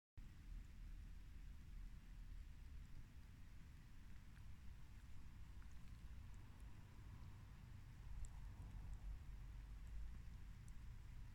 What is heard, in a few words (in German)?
nasse Wiese; Modulkoffer